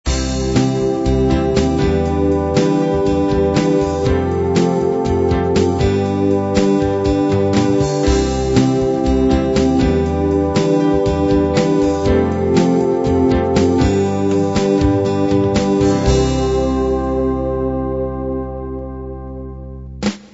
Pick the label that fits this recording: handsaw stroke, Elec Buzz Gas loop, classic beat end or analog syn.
classic beat end